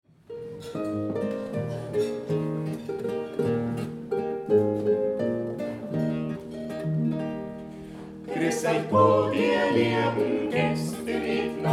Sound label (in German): Zungenbrecher Zwiefacher